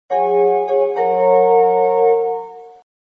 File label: chime; keio; ttc